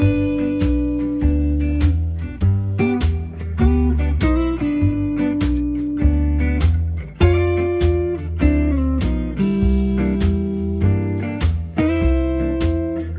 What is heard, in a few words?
workshop shuffle 8khz, hold music; grandstream